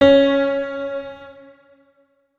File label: notes, keyboard piano; Hideout Furniture